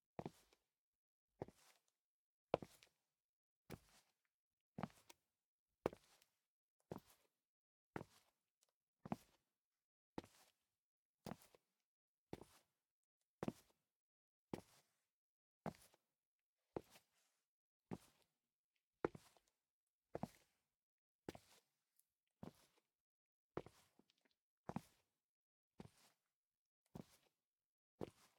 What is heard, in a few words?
Footsteps; LOOPERS; Tyler